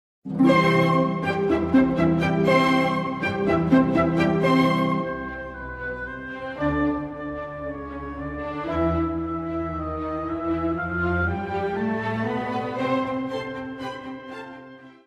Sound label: Flute Harp Concerto 1st movement